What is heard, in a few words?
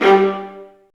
String Sections, Violas Marcato; Roland LCDP13